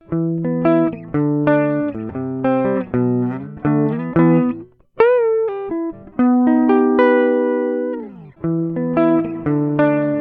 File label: Clean Spring, rev; Nobels REV mini